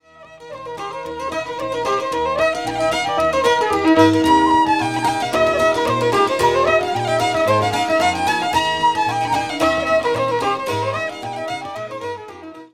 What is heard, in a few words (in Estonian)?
muusika